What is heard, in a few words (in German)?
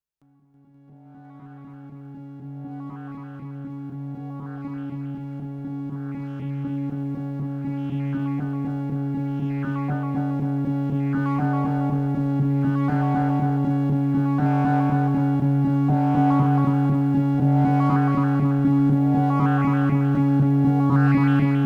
2 Stimmen Sequenz